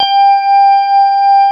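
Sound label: CX3 organ G5 R, Keyboards Old School; USB Soundscan vol.09 - Keyboards Old School; AKAI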